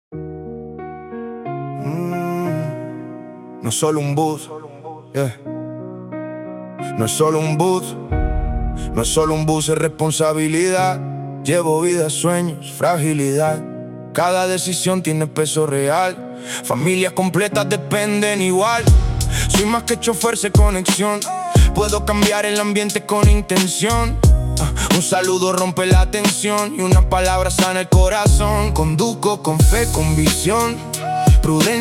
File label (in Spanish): rap